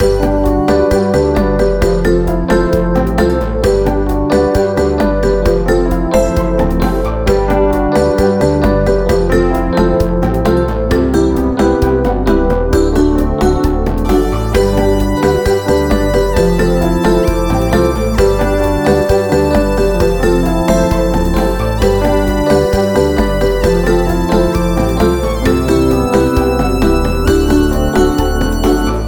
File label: Loop Shop, soundtrack; Baboon